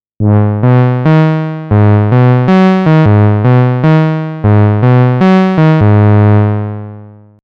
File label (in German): Saw mittlere Cutoff Reso10